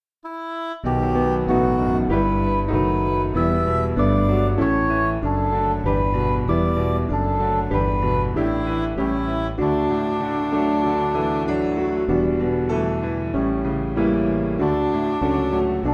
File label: Midi; Come Dearest Lord